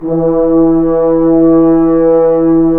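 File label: french horns, swells; Roland L-CDX-03 Disk 2; F.Horns 1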